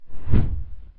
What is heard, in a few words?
Dragon Wing Flap; CastleMiner Z; Xbox 360